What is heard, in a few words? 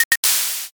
lighter